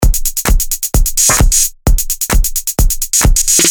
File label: beat